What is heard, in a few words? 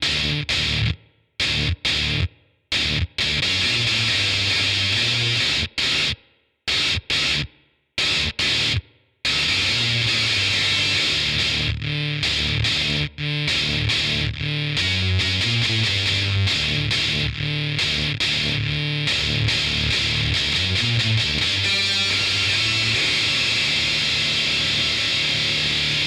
Ultrawave SA 250 Midscoop Extreme Distortion; Source Audio